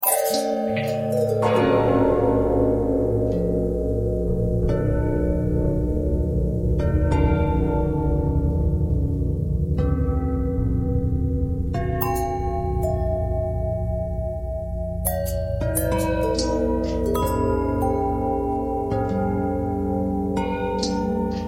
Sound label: bell long bell bend twist, bells